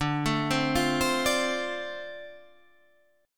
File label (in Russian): Perebor Dm7